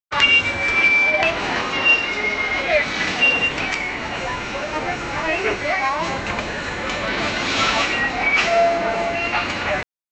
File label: opens with the vibrant da, market